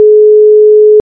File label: phone calling tone